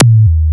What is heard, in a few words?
Chunk, Drums, Industrial; Sonic Foundry (Sony Creative Software)